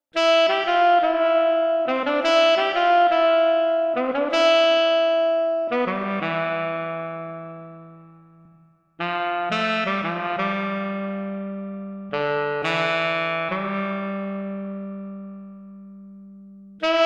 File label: saxophone; Melodic dictation; MC Musiceditor